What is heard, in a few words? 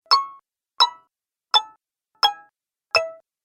App Menu - Five Sounds, Ping